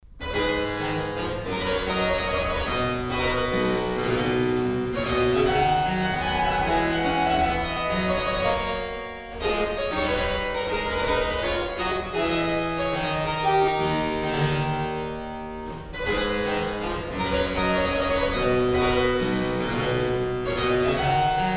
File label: courante